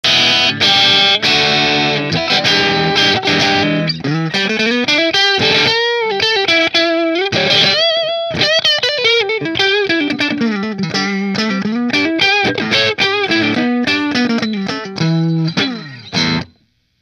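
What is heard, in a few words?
Nola Voodoo humbucker middle split marshall, guitars; New Orleans Guitars Voodoo Custom Redwood Top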